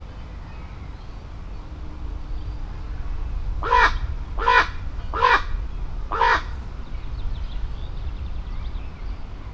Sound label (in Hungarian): negyet karog, hollo; allathangok; veszpremizoo 2013